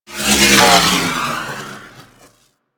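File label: Space Fighter Projectile Whiz By; Space Fighter Gox Agent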